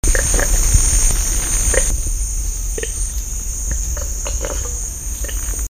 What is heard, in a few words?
Throatscrape